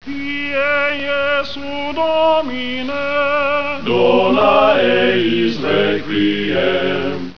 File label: monty python chant